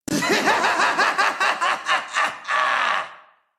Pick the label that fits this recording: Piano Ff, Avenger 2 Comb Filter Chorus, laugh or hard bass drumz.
laugh